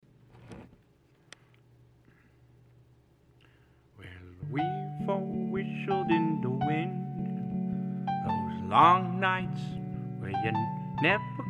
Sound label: whistling in the wind